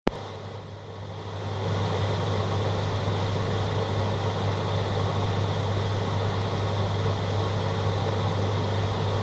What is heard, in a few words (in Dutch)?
scorpion droog geluid; Byzoo